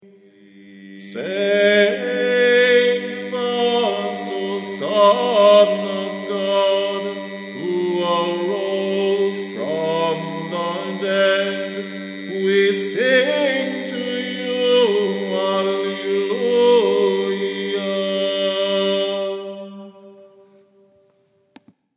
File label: Save Us English